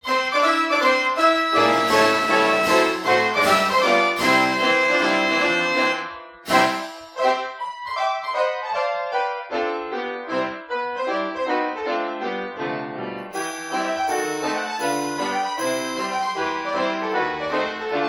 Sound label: wurlitzer